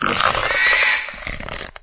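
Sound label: creak of a shell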